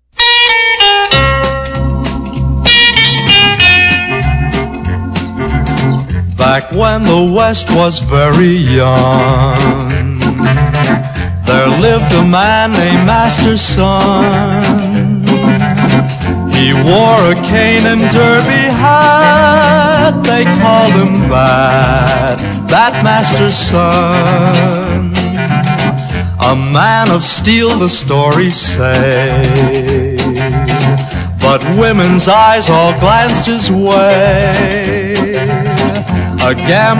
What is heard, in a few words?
Orchestra